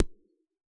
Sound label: Pulse H, Yamaha DJX; 155 DJX Kit